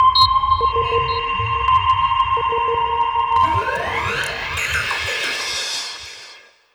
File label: Games Beeps; Jungle; Blu Mar Ten - Jungle Jungle - 1989 to 1999 Samplepack